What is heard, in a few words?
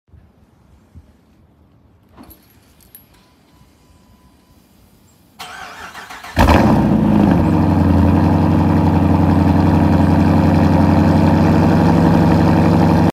Jeep Grand Cherokee